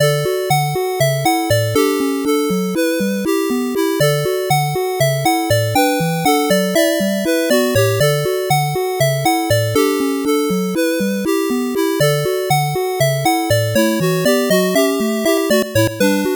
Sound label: 8bit bgm fast